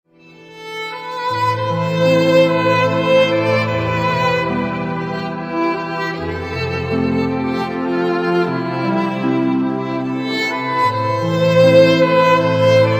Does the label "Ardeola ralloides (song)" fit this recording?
No